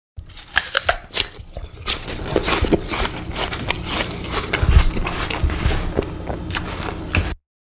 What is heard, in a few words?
Apple Crunching